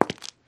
footstep concrete

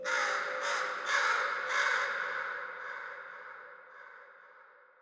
crow, ambient, nature, birds; Portal 2

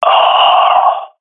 death, swat fem; Counter Strike Model Pack; CS:GO

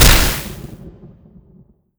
shockwave projectile end

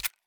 deagle special lookat; weapons; Counter Strike Model Pack; CS2

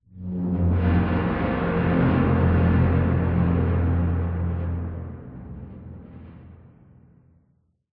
amb apocalypse wronk, ambient city

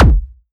mg lfe, weapons; combine; Half Life Alyx

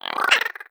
vox jump, creatures, snark

